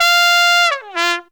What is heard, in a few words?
TRUMPET FX; Zero-G; Phantom Horns